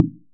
impact Wood medium

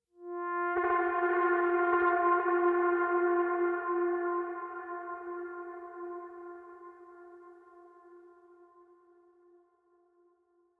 Howl; Martian Chess

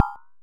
impact Glass heavy

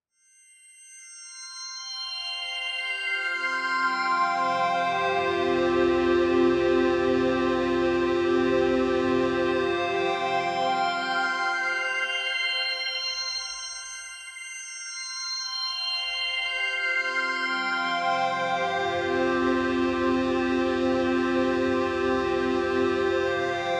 Chord Ramp C; Martian Chess Audio

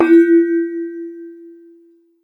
Water Bottle - Normal

